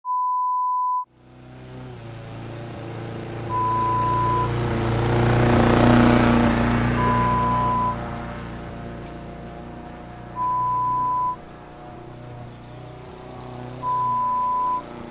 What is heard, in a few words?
Mowing lawn